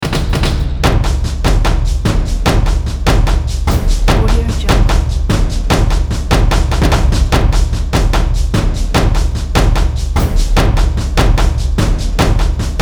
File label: action drums